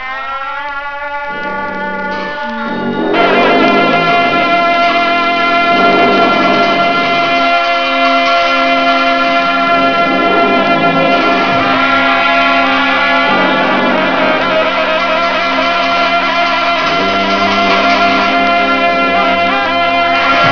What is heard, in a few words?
tibet instruments